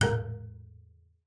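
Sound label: bass col legno A#4; Xsample VOL-5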